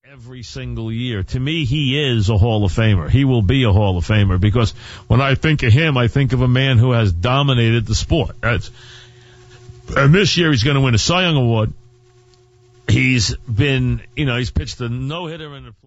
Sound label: MASSIVE BURP; WFAN